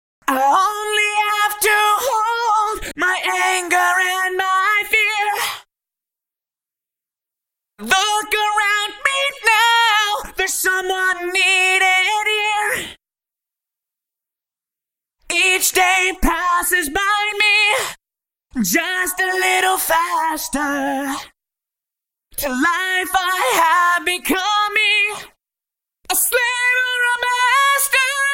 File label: Eventide Instant Phaser Vocals; Eventide Model H910